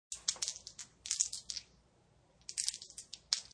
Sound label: Fiddling with dice; Dice in Hand; Casinos